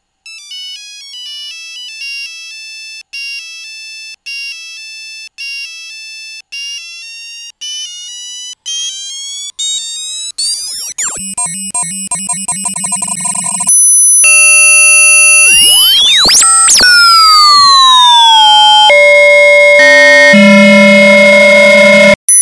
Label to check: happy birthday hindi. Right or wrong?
Wrong